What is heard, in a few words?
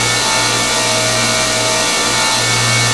A#3 CRYST, ELECTRO GLASS; Northstar; The Wizard VOL-1